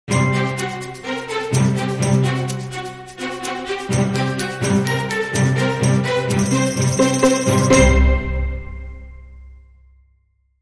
Dramedy Sting

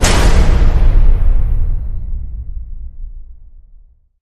hit big

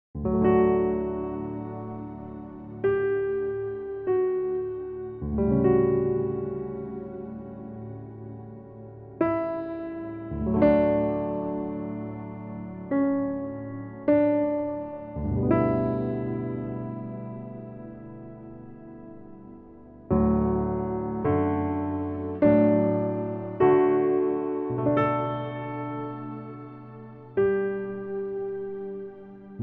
Piano

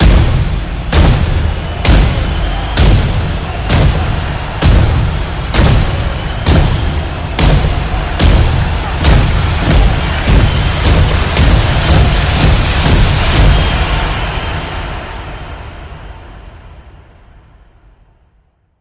Crowd Hype Stomps Rise

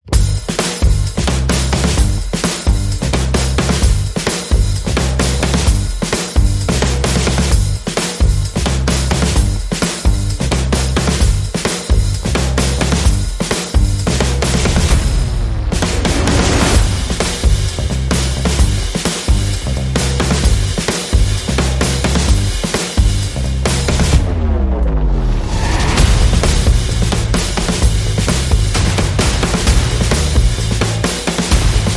Funky Gambit, Percussion Bass and Effects

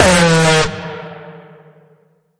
Bend The Alarm E

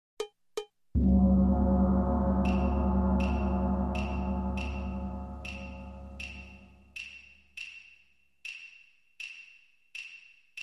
Japanese Loop

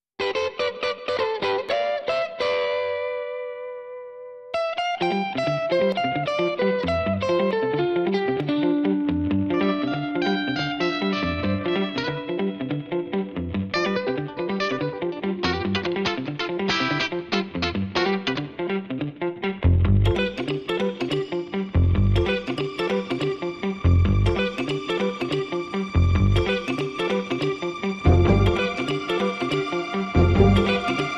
No Percussion

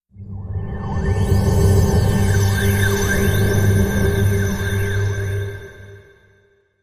Saber Drone

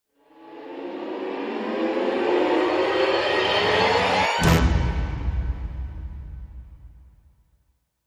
Tension String Slide & Hit

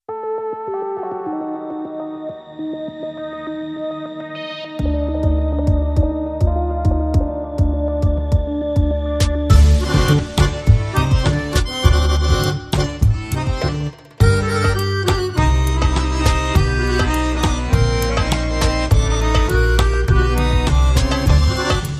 Balkan Tango Inst

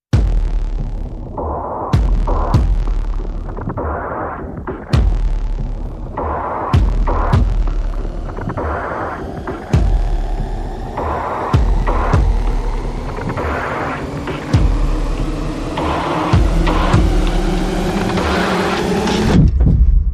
Perc And FX Only

